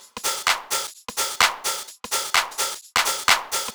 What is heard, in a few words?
drum 128 snareless top